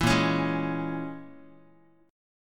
A sharp Minor 7th Flat 5th, Guitar; strummed; 4A,4E,5A,5D,5G#,6C#; 7,6,4,6,x,x; JGuitar

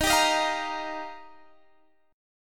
D sharp Major Add 9th

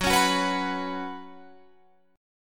Gb Suspended 4th Gb, down, Guitar, Drop D

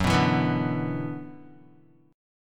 F sharp 7th Suspended 4th Sharp 5th, Guitar

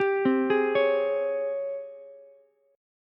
Ab Major 11th Ab Ukulele C6; AbM11 arpeggiated; JGuitar

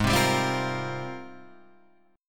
G sharp Major 13th, Guitar Half Step Down

